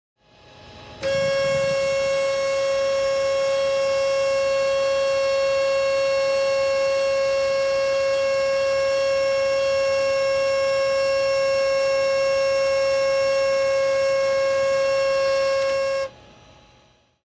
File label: Baggage Carousel Beep Sound